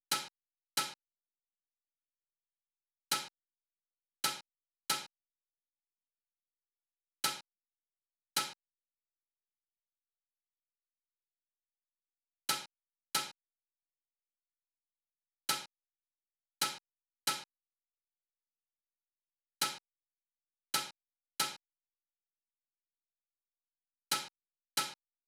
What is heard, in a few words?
hat